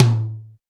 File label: TOM, Drums; Best Service; Real Mega Drums VOL-1